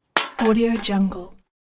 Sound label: aluminum can drop